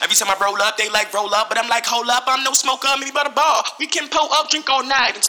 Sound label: vox